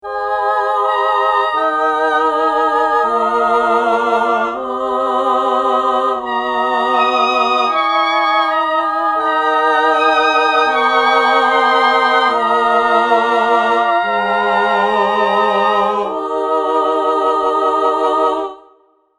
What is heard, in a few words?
female voice chamberlin